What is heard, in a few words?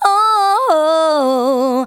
VOCALS SUNG; Techno Trance Essentials